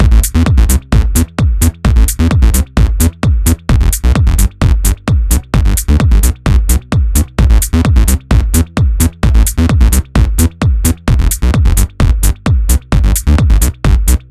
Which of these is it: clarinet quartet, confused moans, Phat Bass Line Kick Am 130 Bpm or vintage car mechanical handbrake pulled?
Phat Bass Line Kick Am 130 Bpm